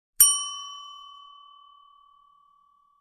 Hotel bell

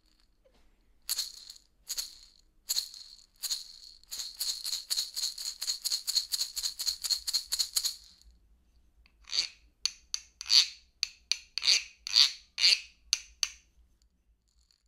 Güiro; گوئیرو

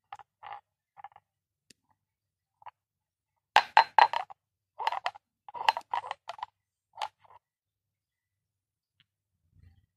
Feng Shui ball

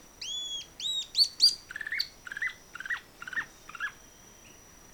Lanceolated monklet

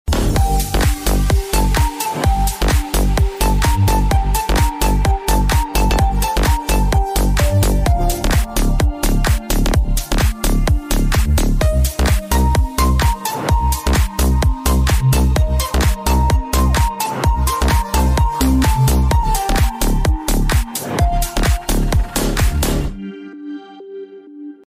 Techno ringtone

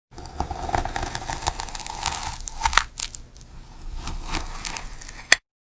cake cutting

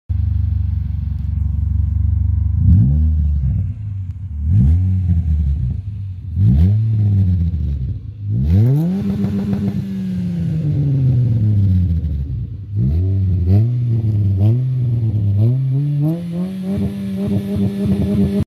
L90 exhaust

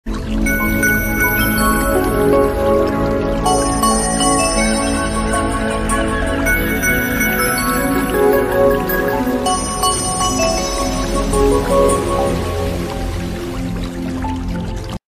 Nature alarm; صبحگاهی; زنگ هشدار صبحگاهی طبیعت

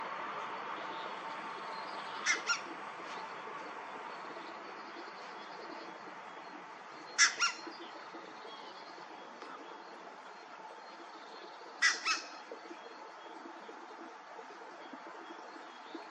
red breasted goose

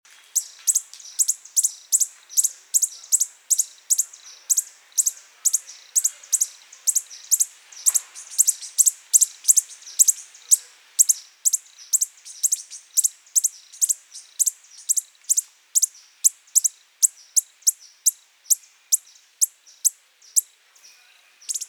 Coppery headed emerald

